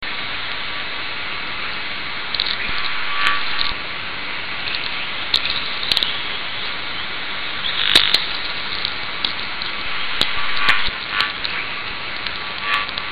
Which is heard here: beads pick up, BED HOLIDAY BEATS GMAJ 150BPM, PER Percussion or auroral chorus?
auroral chorus